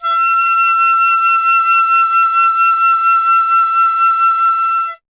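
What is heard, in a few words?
FLUTE PICCOLO E; Miroslav Vitous - Solo Instruments VOL-2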